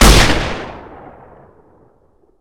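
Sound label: Weapon sawed off shotgun fire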